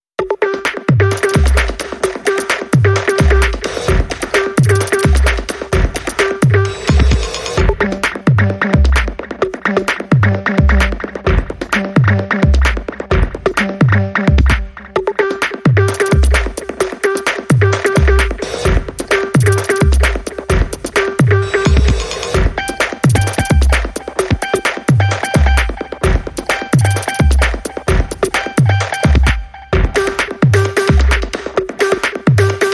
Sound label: Big Bouncy Beaty